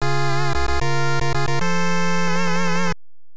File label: Received Item; Willow; NES